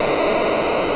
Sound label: thrust; vooga; asteroids